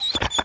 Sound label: injured out, breath; reviver